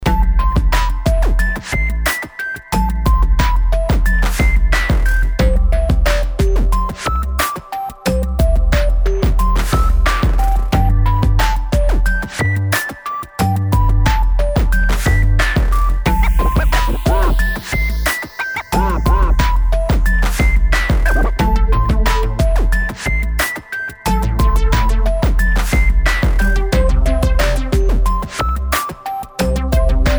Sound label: hip hop